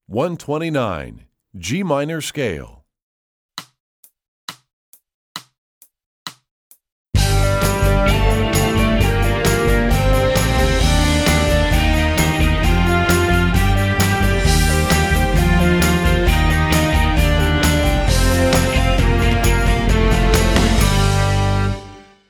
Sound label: G Natural Minor Scale, Strings; EE2000 Book2